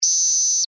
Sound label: Insect Voice Chirp, Vocalization, Bug; Animal